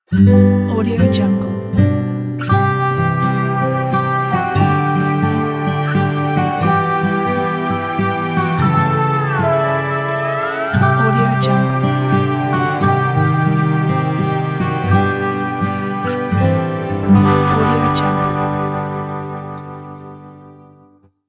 logo country love; flaviocortizo

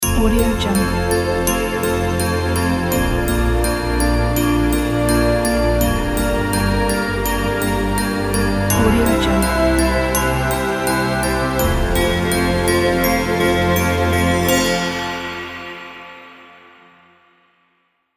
christmas carol ident

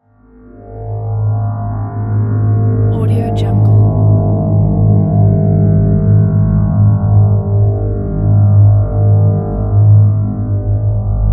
atmo drone dark moving string; smartsoundfx

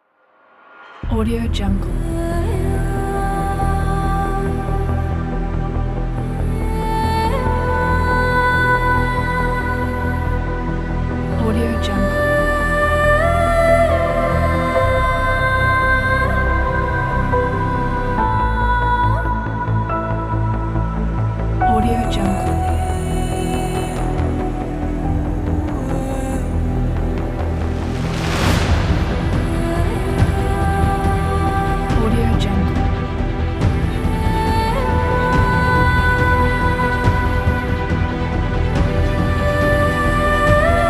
epic

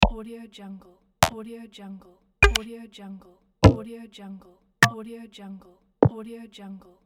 mellow button clicks

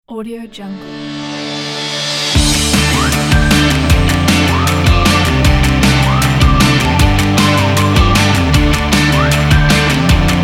cool fun upbeat indie rock ident

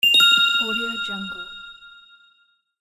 notification

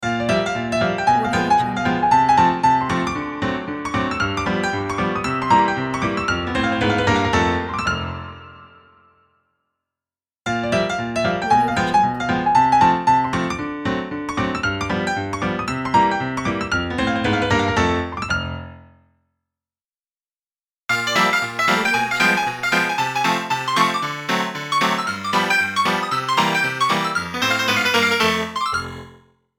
tin pan alley piano logo

